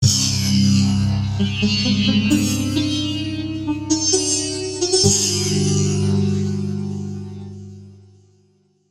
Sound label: LAMP SPRNG tamb; eShop